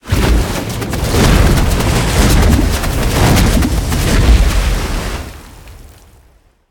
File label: Ice Storm; smite; god merlin